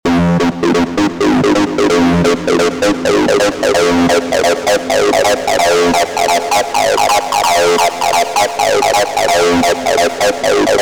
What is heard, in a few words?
Arp